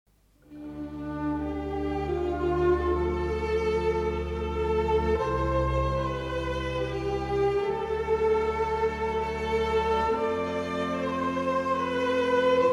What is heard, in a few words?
melancholy strings